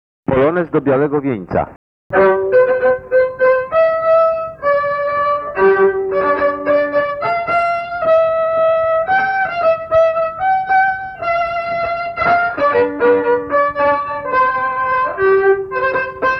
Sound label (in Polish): skrzypce, polonez chodzony